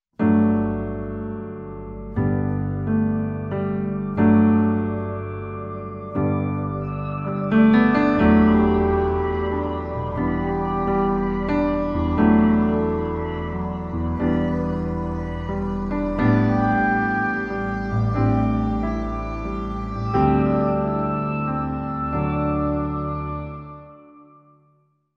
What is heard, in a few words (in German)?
Stille Nacht Instrumental